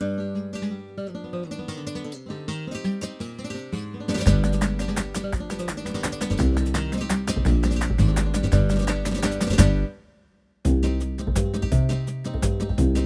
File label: Flamenco